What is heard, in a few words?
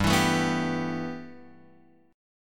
G 6th Add 9th, Guitar, Open F; G6add9 strummed